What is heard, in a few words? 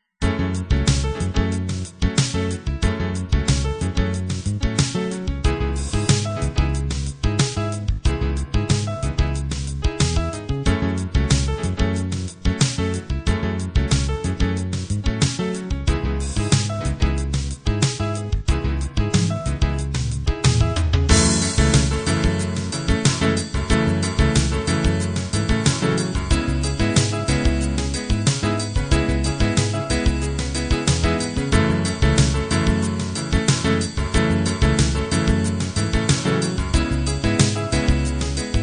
piano jazz-pop